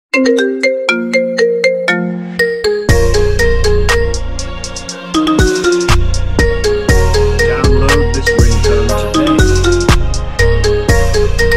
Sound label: the box marimba